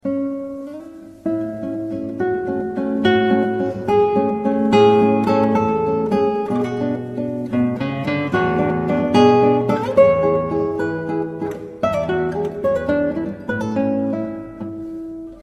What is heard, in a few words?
nokia ringtone; francisco-tarrega